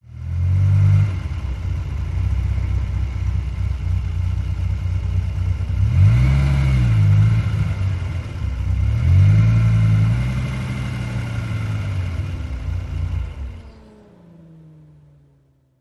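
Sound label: Accelerate Forward Gear, sports car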